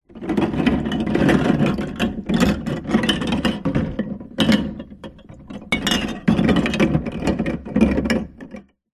food drink bottles beer in plastic esky filled water movement; zapsplat